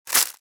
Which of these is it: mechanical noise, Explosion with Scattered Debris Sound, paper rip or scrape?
paper rip